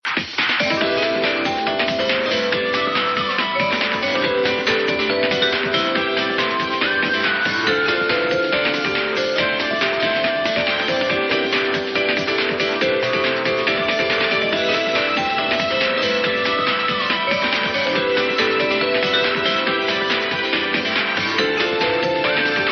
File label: Angel Attack Ringtone; ringtones